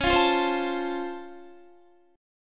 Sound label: Db Augmented Major 7th Db down Guitar; strummed; 4F,4A,5C,5G,5C,6E